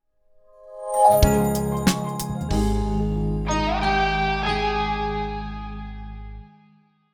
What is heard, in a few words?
Morning Jingle